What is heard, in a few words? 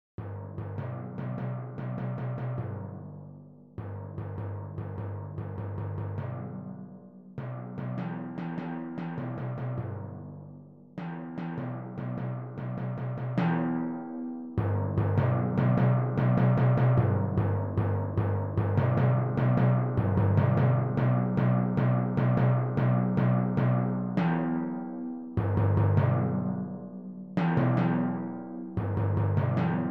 Timpani; Band Audition